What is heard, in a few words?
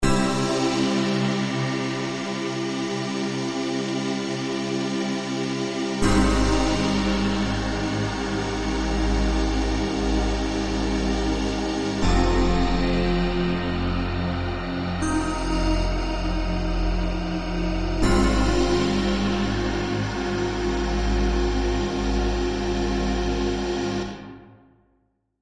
pad breathy texture